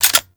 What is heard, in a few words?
Cannon Fire Mode Switch Cannon; Grineer